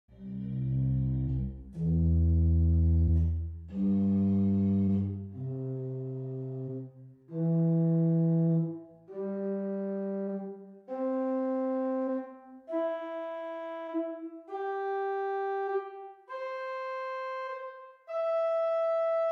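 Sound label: Great Open Diapason Small 8 arpeggio, organ stops; Hauptwerk; St Annes Moseley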